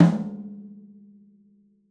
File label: Snare Off